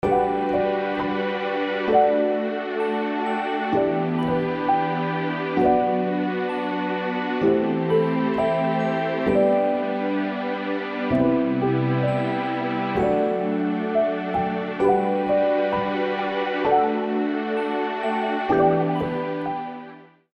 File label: PROM G 130 BPM